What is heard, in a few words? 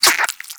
Vocal Tranq Grunt, Hunting Critters; Nexifera